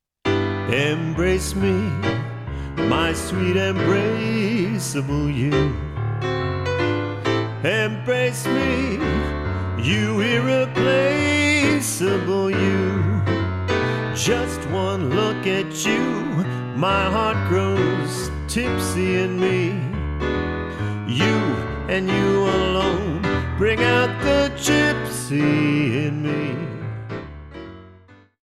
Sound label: Piano Vocal; Jazz